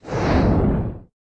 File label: Fire